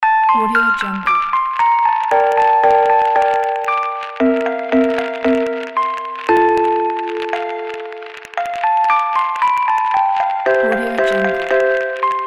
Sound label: fairytale music box loop